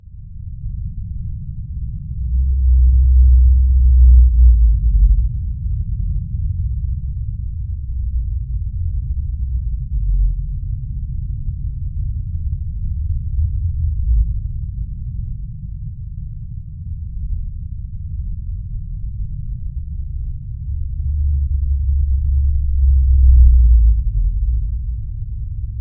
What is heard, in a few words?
blue whale d calls; 48khz; brian miller australian antarctic division